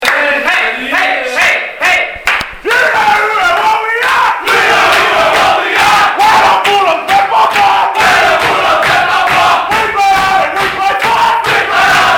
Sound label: team Song; bulldogs; 2011 nswrl